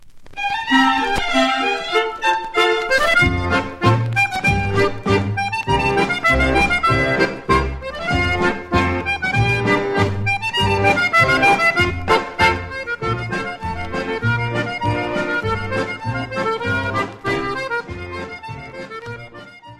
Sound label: Horlepiep, Pays-Bas